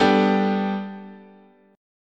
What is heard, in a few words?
G Major Add 9th, chord